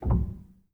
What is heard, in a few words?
rel, Grand Piano; bitKlavier Grand; Piano Bar